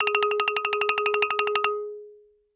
Xylophone hard rubber roll ff Ab4 stereo; MIS Pitches - 2014; Percussion

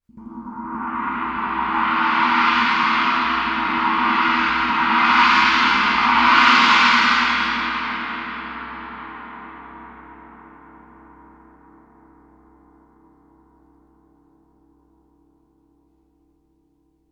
chinese mallet roll, Suspended Cymbals; Percussion

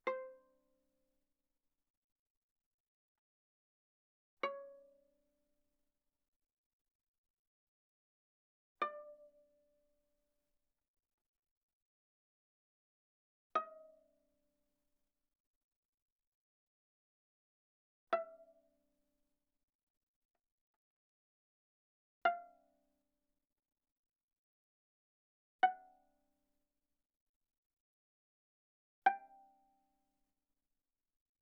Viola pizz sul A ff C5 B5

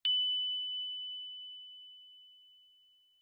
Crotale ff Gb7 stereo; Percussion; Crotales

